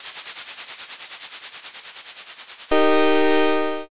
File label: answer roll